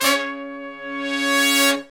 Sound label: BRS LONG, R&R Horns, Sect; Roland L-CDX-03